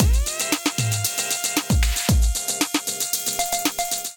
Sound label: Slow Trap Kit groove 115 bpm